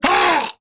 Melee Attack Grineer Ghoul Sargeant; Grineer Ghoul Drill Sargeant; Battle Barks

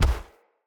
Step Default, Footsteps; Enemy; Corrupted Sproutfolk

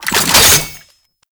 Shotgun Reload; Tenno; Alchemist Shotgun Deluxe

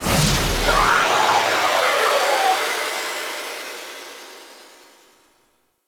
Ship Reactor Power Absorb; Warframe; Corpus; Ship Remaster

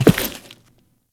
Step Default, Footsteps; Alad V

